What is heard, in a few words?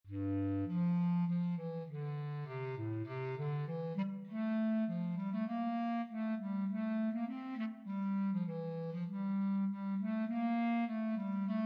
bass clarinet